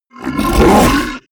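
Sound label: fishman bark, creatures; kreaturen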